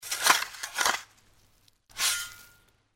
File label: shovel